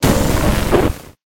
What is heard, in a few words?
Death; MiSide